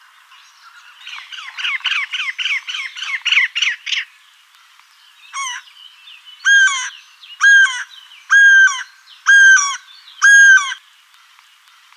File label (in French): coucou geai chant cri